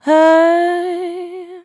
hey SUNG

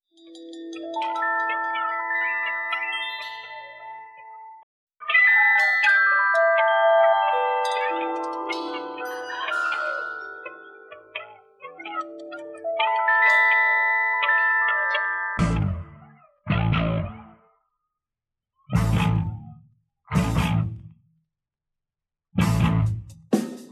vibes, phonky loops

